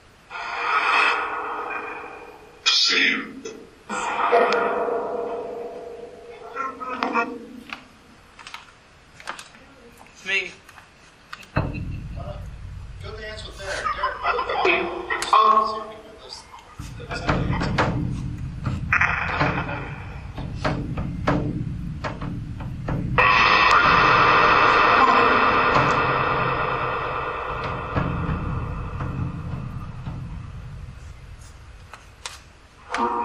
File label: Phasma Box; beaver area high school investigation